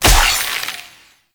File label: Infested Lich Parasitic Link Loop End; Warframe; Abilities; Zeke